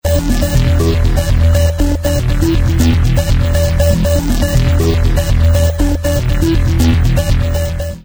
Synthesizer Electronic music, like trance, techno and house often uses electronic rhythms